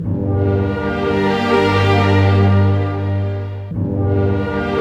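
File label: 60 dark, orchestra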